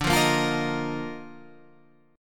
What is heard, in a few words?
D sharp Major 7th Suspended 2nd D